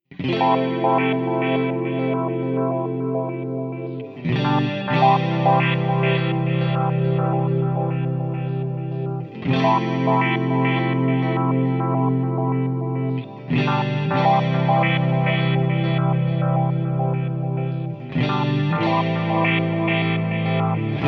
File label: clean; gt-001 seq